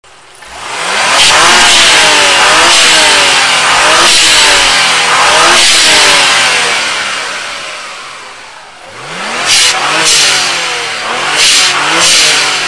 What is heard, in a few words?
blowoff low